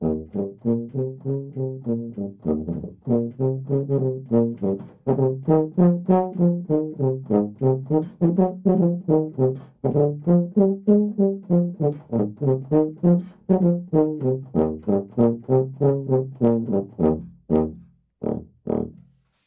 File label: Tuba